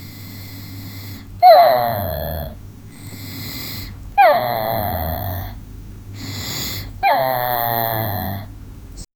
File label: girl snoring loud; sleep